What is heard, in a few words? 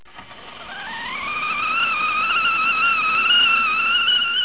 kettle whistle; camper